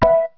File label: notification beep; phonegap-wp7